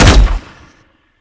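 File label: smoke explosion body; gmod; viper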